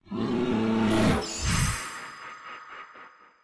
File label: sticky scatter bear; druids dream